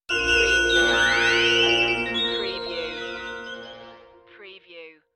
Dark Production Stinger

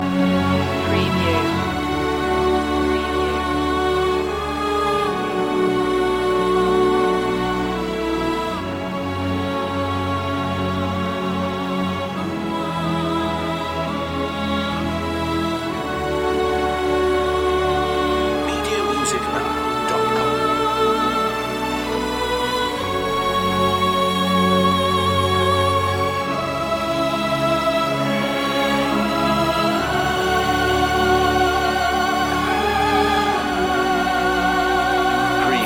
orch and choir

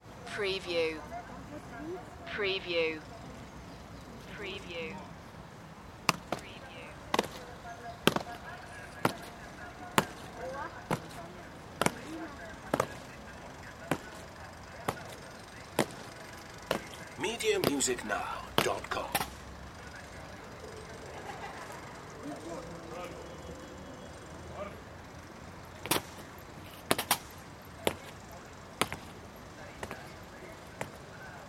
kremlin guard ceremony

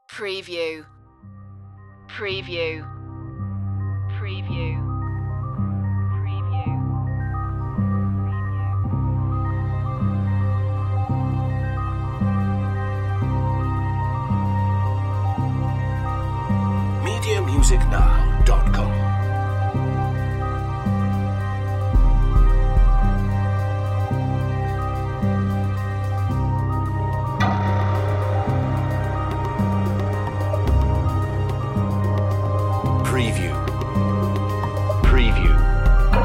underworld series dramatic cue

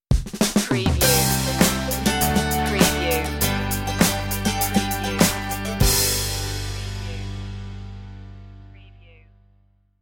family tree stinger

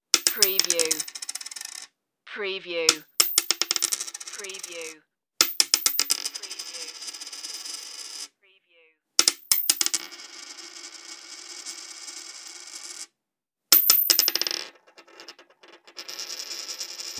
dropping coin on table

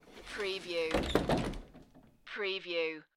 Door Closet Close

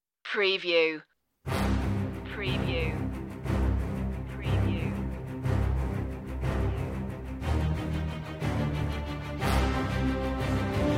strings brass

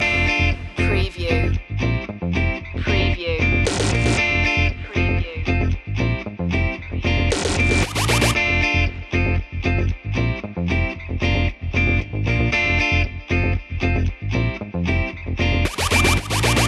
disco stepping loop

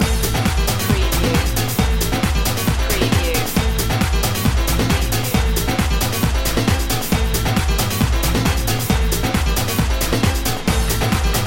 fiesta latino

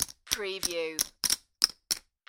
Poker Chips Stacking

